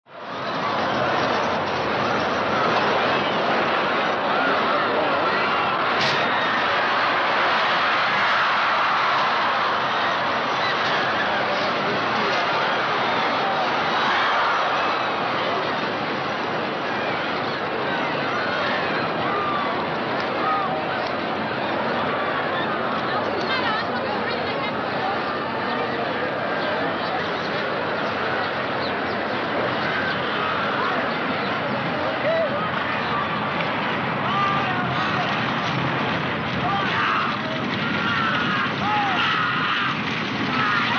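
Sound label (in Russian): звук скачек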